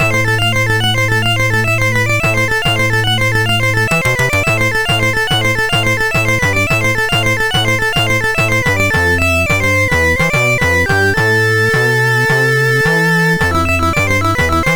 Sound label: -, MOS6581R4